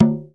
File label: MALAY DRUM; Spectrasonics - Heart of Asia CD2